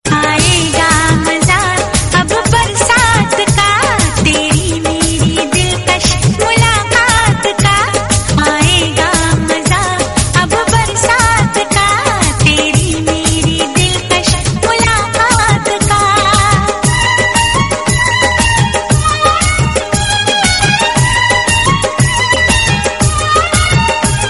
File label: bollywood song tone ringtone